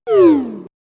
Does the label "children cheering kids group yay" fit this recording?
No